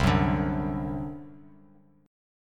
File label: C Suspended 2nd Flat 5th C down Bass Standard